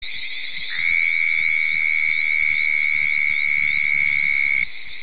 Southern Toad Bufo terrestris mating call

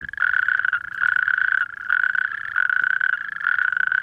Natterjack Toad Epidalea calamita mating call

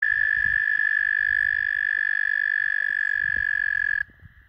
American toad mating call

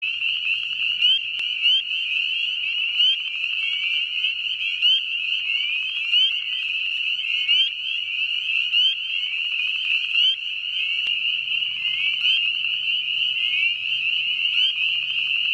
Spring Peeper Call

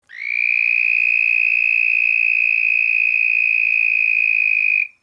Red spotted toad Anaxyrus punctatus mating call

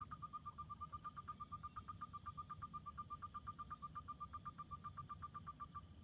Western Toad Anaxyrus boreas mating call

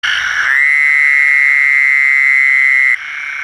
Fowlers toad Anaxyrus fowleri mating call

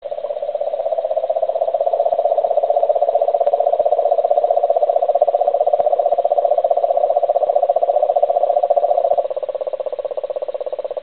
Cane Toad Rhinella marina mating call

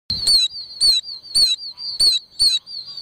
Oak Toad Anaxyrus quercicus mating call

